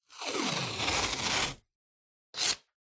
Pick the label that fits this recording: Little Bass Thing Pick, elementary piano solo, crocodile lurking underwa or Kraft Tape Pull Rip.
Kraft Tape Pull Rip